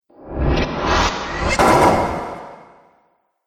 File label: Starship Backfire; Spacecraft Sound Effects